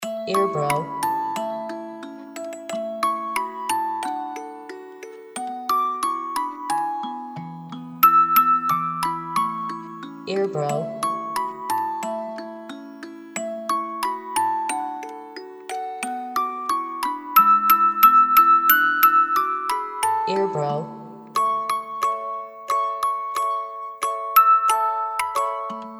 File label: Jingle Bell Orgel